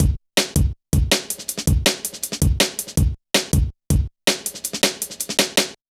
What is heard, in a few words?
Kicker, Jungle, Drum Loops 161.5; Zero-G Jungle Warfare 1, 2 & 3